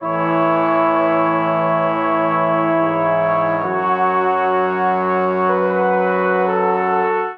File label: Progression Broad I-vi-IV-V A Major 130 bpm, Brass Quartet; MIDI Clips; Ableton; Spitfire Audio